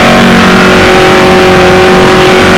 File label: v8; speed-dreams; cars; 36gp-tridenti-v8ri